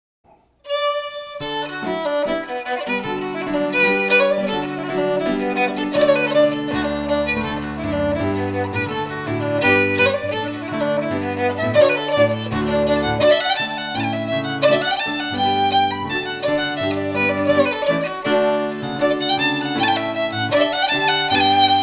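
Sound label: Reel, Scottish Fiddling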